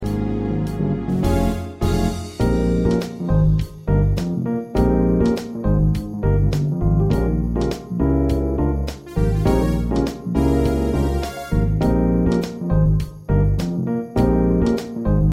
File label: Accompaniment